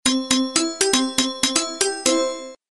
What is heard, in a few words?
Zoo